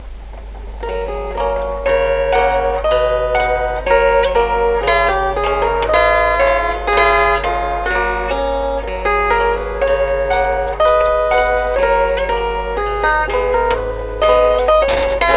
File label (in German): Chordephon